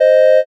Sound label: horn